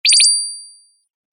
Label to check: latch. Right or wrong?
Wrong